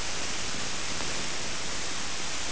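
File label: fire torch; envrn; The Elder Scrolls III Morrowind GOTY; XBOX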